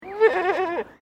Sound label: Animal Goat Baa; Animals from Edgar's Mission farm in Victoria; Goat Sound Effects; Goats (Sound Effects:Animals); Foundation1